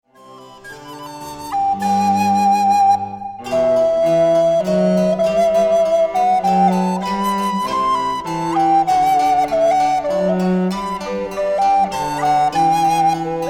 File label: Baroque trio